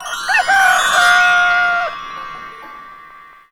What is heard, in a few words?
morning, ambient; wesnoth